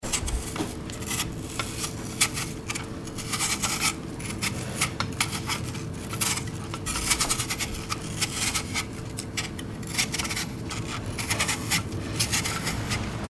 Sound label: apple peeling